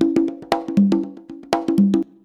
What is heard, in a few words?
CONGA 119, Modern Percussion Loops